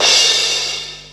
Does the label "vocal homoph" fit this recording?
No